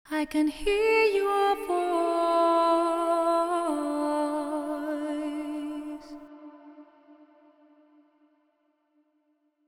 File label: Vocal Wet